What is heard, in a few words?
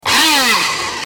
Blender